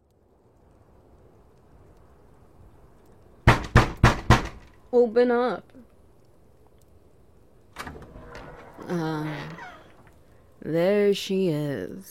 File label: SFX, no wet noises; Happily Ever After